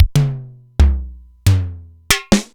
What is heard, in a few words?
Simmons SDS-9 Factory